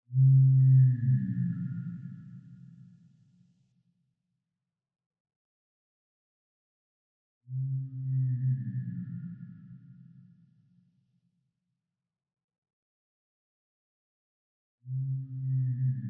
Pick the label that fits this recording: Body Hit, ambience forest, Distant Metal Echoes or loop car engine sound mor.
Distant Metal Echoes